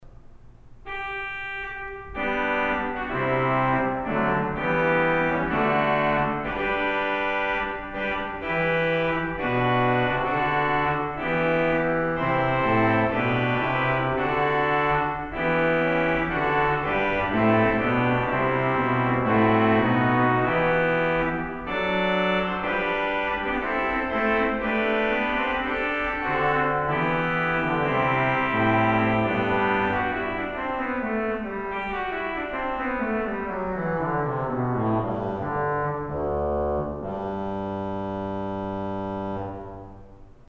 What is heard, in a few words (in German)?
d trompete